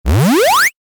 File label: Retro SFX, Retro Style, Harsh, Heavy; Tech; Stuart Duffield